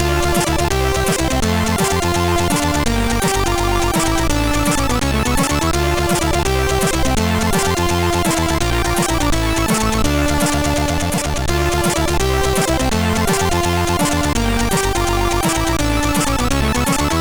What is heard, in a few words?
Metal, sid MOS6581R4; hvsc